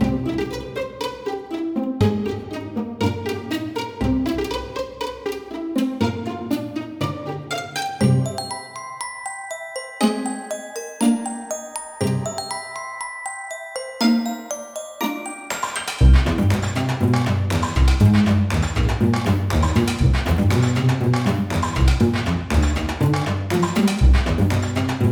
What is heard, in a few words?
2D MUSIC LOOP; UnityTechnologies; StarterAsset